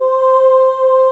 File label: RV CHOIR